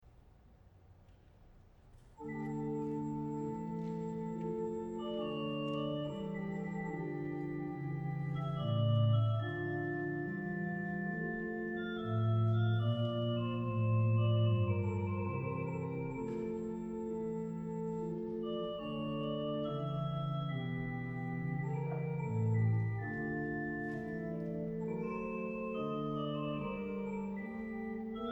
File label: organ concerto in F major adagio; handel; op. 4 no. 4